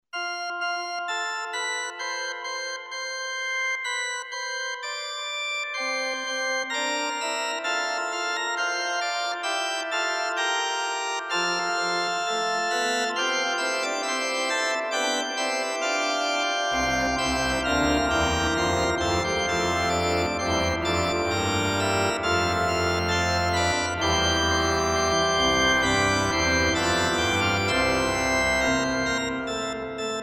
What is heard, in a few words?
Fuga Bas Bombarda, organ; Seger